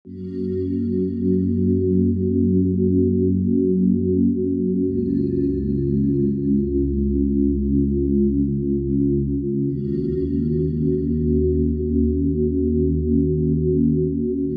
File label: summer rain melody background music for meditation and yoga